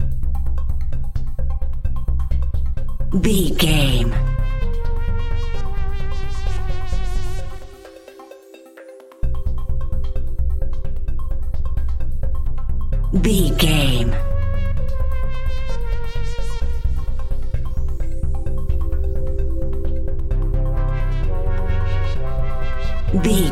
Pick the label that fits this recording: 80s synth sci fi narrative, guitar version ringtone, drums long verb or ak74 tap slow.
80s synth sci fi narrative